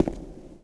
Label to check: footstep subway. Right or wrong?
Right